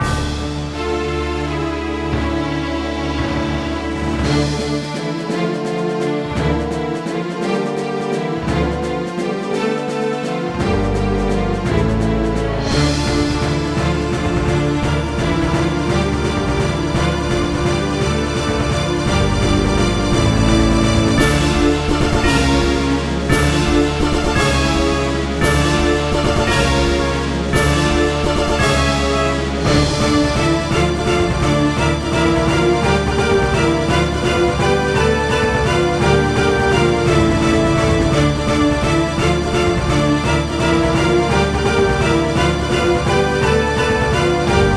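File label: background music map; ovenbreak s1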